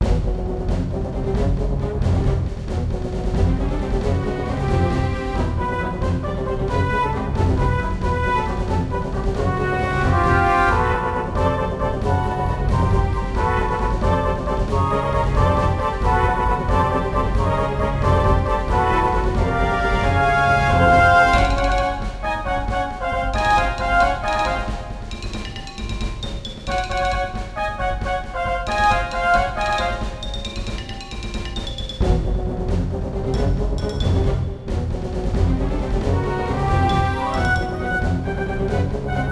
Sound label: sneak level; Sid Meiers Pirates; XBOX